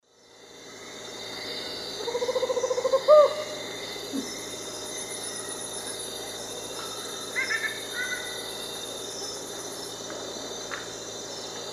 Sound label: Megascops choliba, song; S. America; Eastern Lowlands